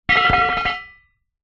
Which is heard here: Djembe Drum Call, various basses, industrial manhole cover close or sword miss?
industrial manhole cover close